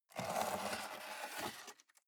cardboard box close scrape; objekte

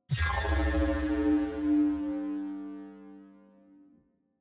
energy field vanish; energie

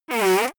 nose blow

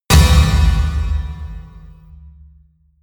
anvil drum hit

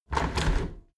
window handle squeak turn close heavy; objekte; fenster

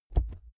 wings flap deep; natur-tiere; voegel

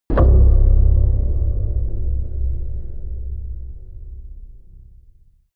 sub scrape impact; hits impacts

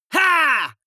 male fight shout; vocals; comedy-cartoon; soundpool

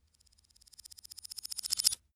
thin metal vibrate reversed long; industrie wirtschaft; metall

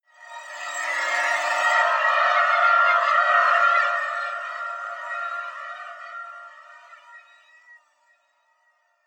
swarm

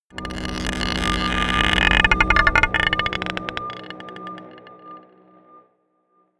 scrapes marble pot dark, drones

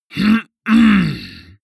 male harrumph angry short; koerpergeraeusche; menschen; soundpool

